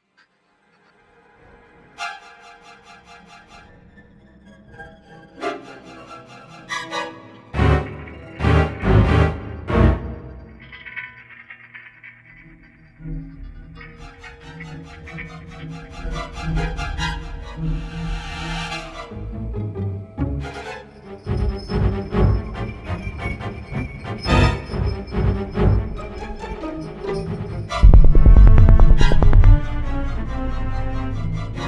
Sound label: trance formation